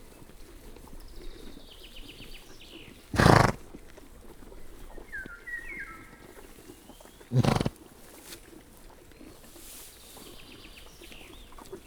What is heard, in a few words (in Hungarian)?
kettő prüszkölés az elején, pónilő; felsőlajos zoo 2015; cardioid